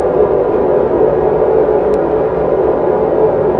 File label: Industrial Drone; de alliance